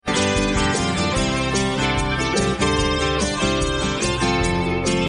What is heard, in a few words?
acoustic folk fiddle solo; riffusion-app